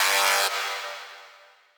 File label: 128BPM Kit solo; Shockwave(PIL) - Future Big Room vol 2; Massive Kit